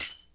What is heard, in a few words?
rune